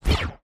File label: Slide Electronic